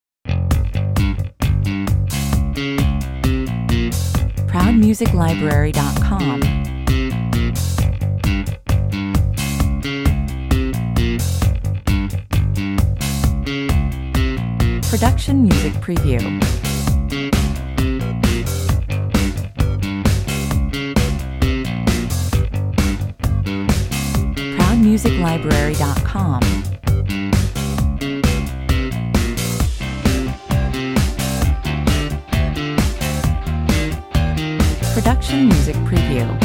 An indie rock style guitar and bass riff combine with disco style